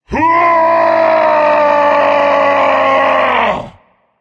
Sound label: battle cry; cerberus